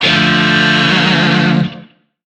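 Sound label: Shawzin Major Chord; Warframe; Lizzie Shawzin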